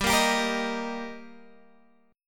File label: G Minor Sharp 5th G, Guitar, Open G; chordshape; down